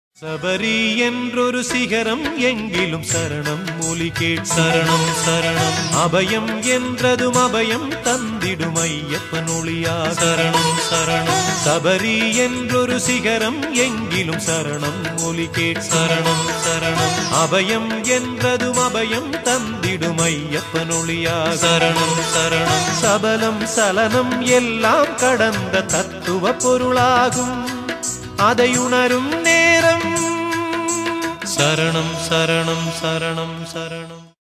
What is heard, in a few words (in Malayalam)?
Saranama Saranam Ayyappan Ringtone Malayalam